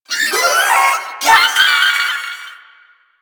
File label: Volatile Runner Taunt Scream; Enemies; Abilities; Warframe; Proto Infested